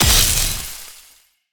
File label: ice pillar explode; action; impact